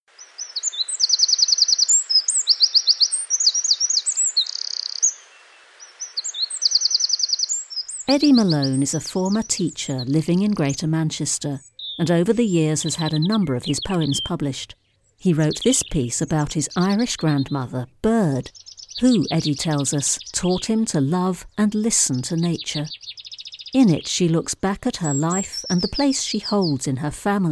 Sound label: Bird